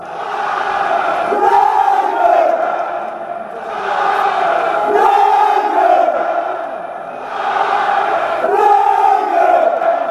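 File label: braga, football chants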